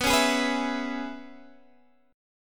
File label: Db Minor 7th B, chord